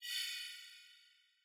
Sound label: ping warn; ui